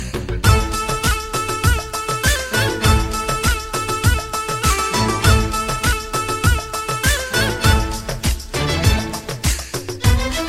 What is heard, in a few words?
Tamil bgm old ringtone